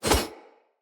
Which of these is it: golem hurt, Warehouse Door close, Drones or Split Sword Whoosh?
Split Sword Whoosh